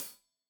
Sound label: Hat Tight, Hi-Hat, Cymbals; Deeply Sampled Drums